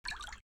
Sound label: Wild Unlock; ZTE nubia Z20